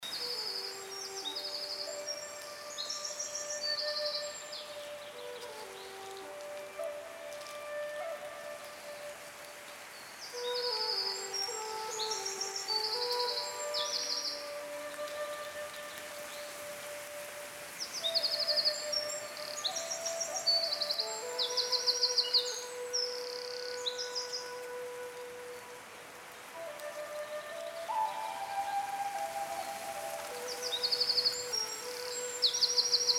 Short Loop, Asian Forest

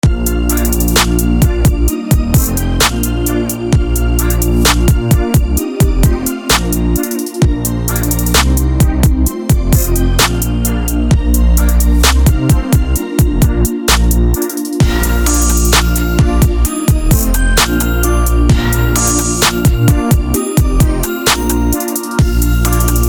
Stoned ass guinea pig instrumental

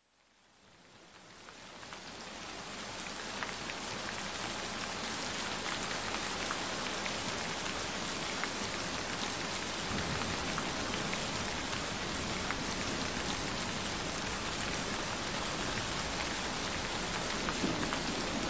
Gentle Rain Sounds Frequencies; Lymphatic System Healing

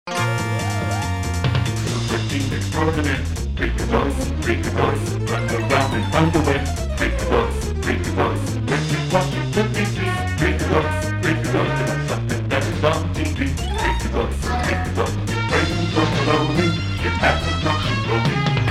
SNES Remix Vocals; SupremeMIDI

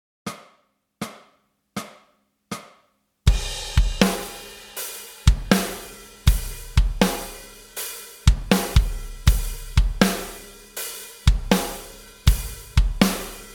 Backing Track

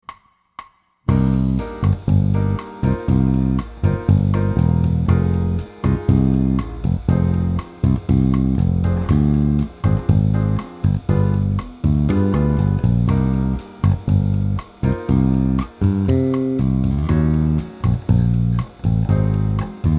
Bossa Nova, Bass Line Example

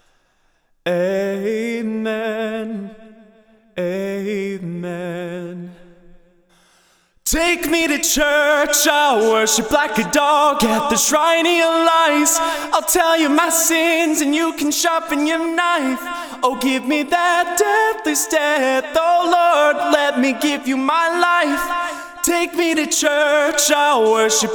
Vocals

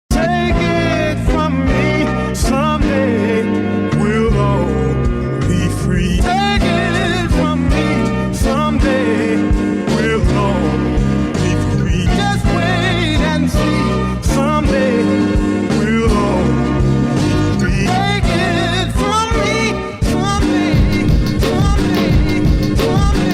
Instrumental

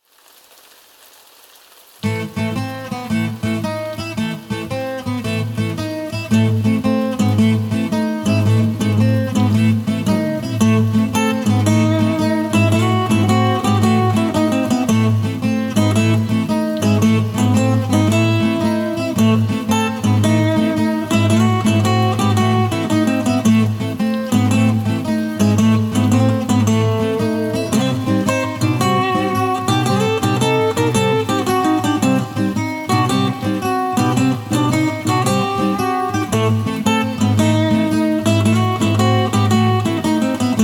acoustic; Slam Shuffle

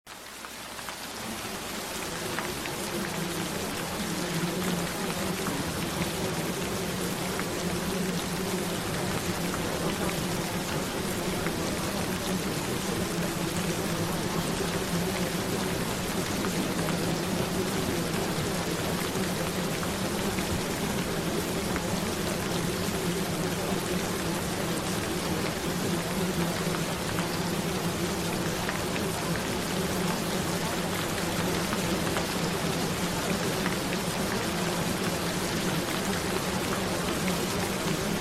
Gentle Rain Sounds

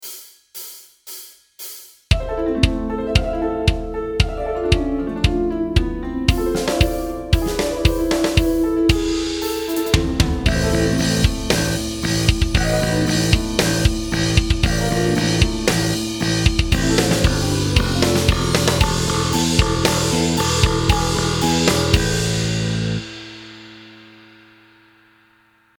SUPER SWEEPS Backing Track

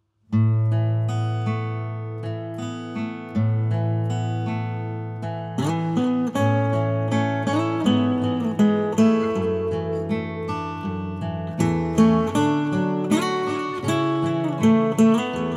Acoustic

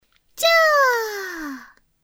alarm

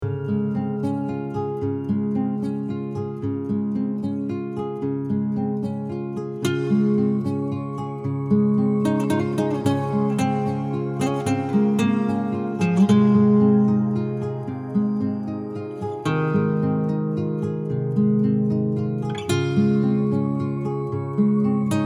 Acoustic

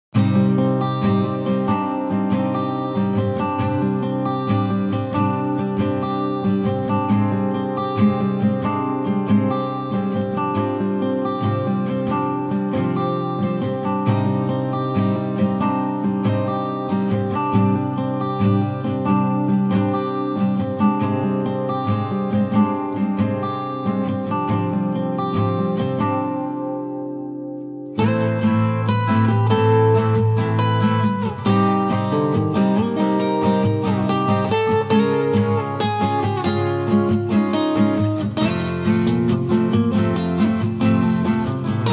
Acoustic Short; Cyber MazeCrater

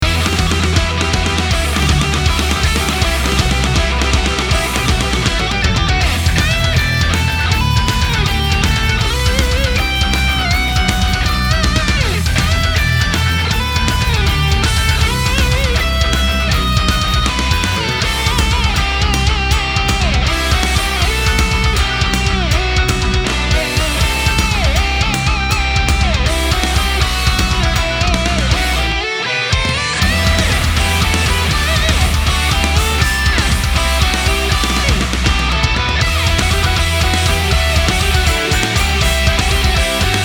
NES Medley

